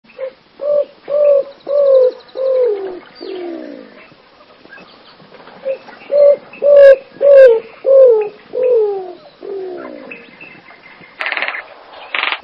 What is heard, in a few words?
Greater Roadrunner, songs